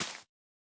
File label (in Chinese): 孢子花破坏声